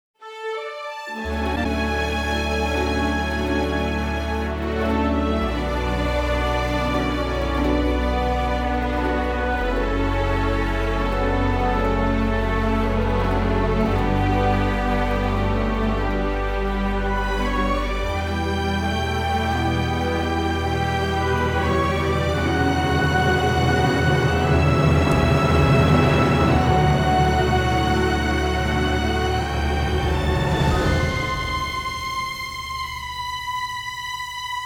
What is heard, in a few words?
VHS glitches Music; crossing souls; ps4